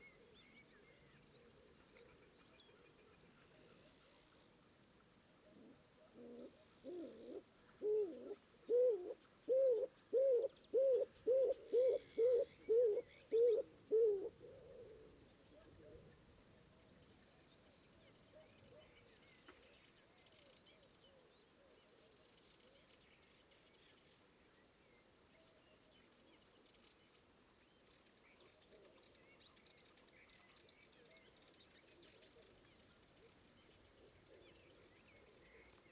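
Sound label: stock dove columba oenas; klintuh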